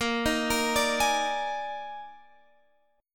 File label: Eb Major 7th Suspended 4th Bb